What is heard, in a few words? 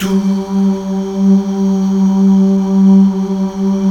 Mal Duh; Best Service Gigapack 1